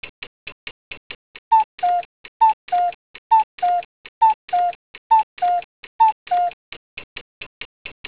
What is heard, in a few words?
clock